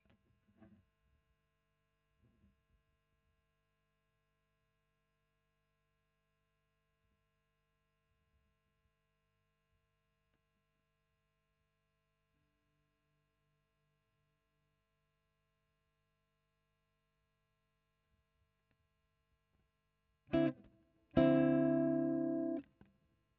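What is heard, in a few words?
electric guitar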